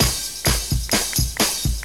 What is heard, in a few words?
dance music; Drum loops